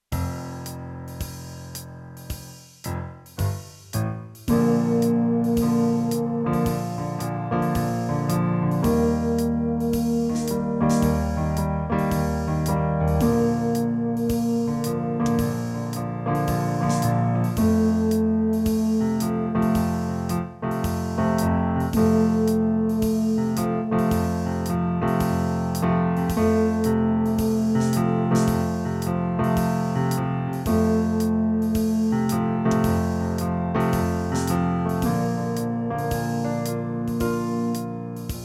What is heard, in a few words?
in Bb low Eb mid, brass